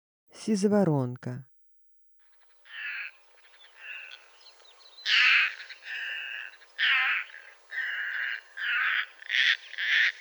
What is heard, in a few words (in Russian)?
sizovoronka golos